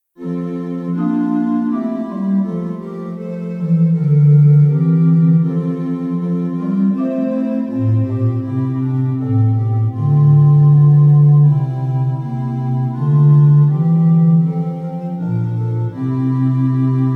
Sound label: Instrumental